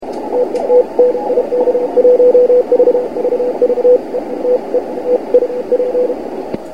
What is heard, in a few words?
cw